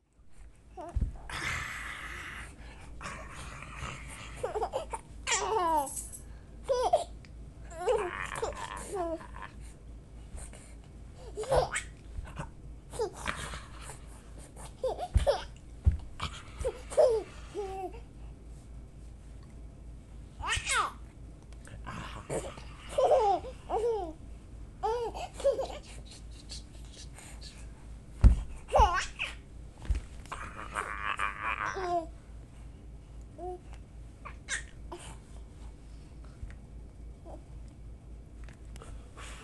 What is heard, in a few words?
tickling and growling